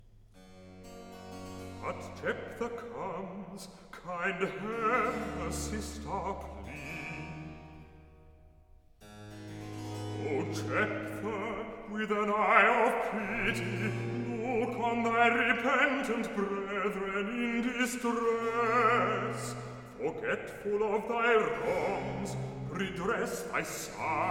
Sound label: Recitative; Act I; Zebul, Jephtha; But Jephtha comes; Klassika; Handel Masterworks